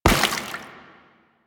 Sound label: Step Water, Footsteps; Lasrian Butcher; Lasrian Soldiers; Foley